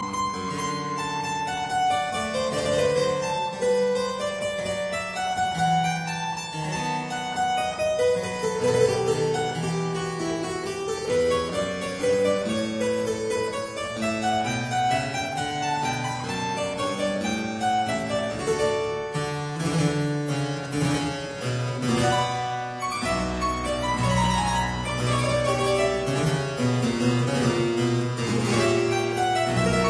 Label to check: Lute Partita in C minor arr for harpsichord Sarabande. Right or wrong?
Right